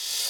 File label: Crash, Hip-Hop